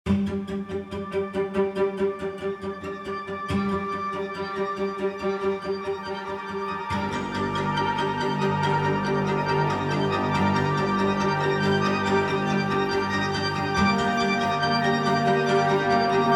Rhythmic Textures

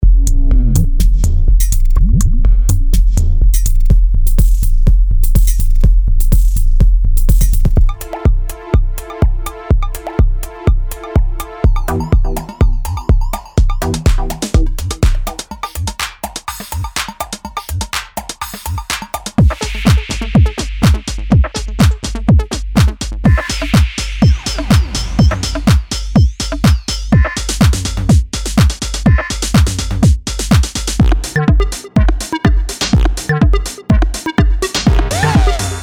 Analog Kits Medley; MASCHINE 2.0

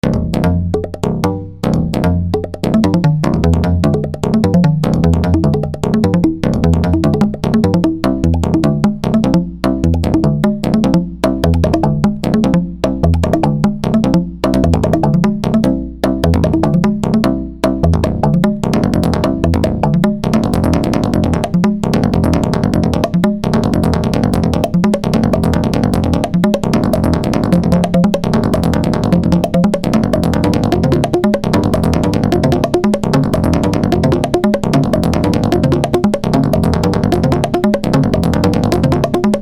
Tube Drum Paddle Solo; Soniccouture

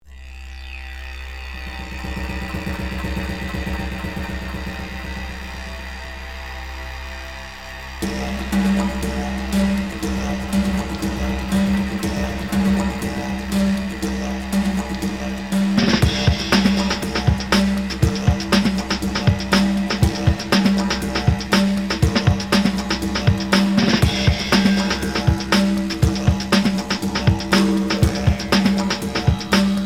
Chemical Didge, Didgeridoos; Craig McConnell; Soundiron Didgeridoos

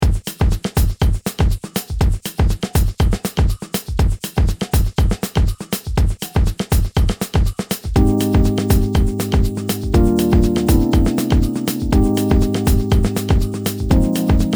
Afro Groove; iMaschine Expansions

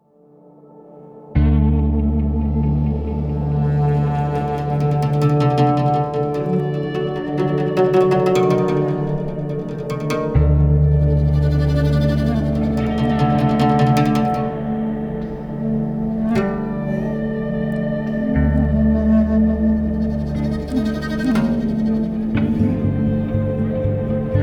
World Strings Oud Mystic; Evolution Series

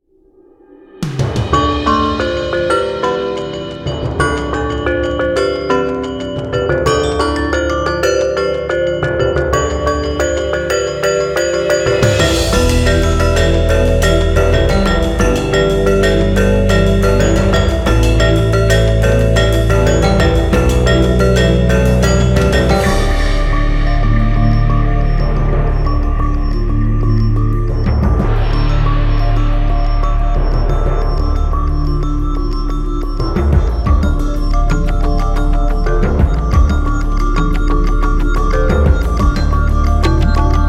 Modern Gamelan

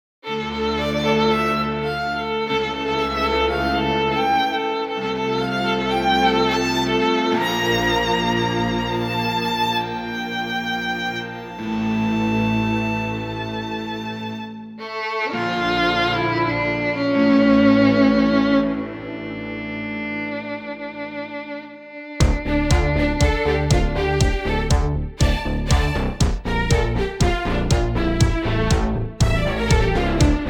pop strings

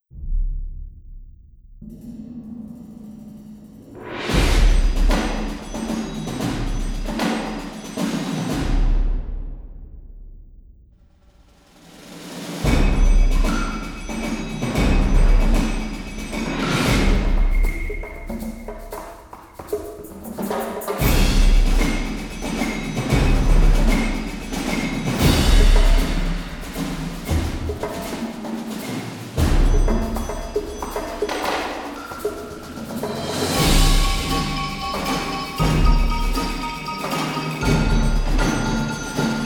hallway perc; truestrike; Projectsam

